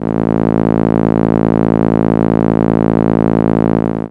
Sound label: Contra A1, ARP Solina, contra bass; musicradar-synth-samples